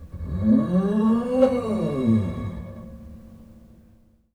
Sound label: String Rub, piano; Piano Miscellaneous